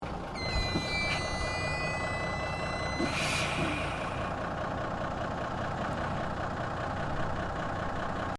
Truck Military M816 Wrecker Brakes Idle, Tank Transport vehicle; Trucks; Vehicles